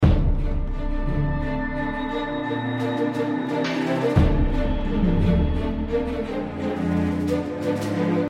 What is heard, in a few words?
cinematic neutral; hero of sparta; iphone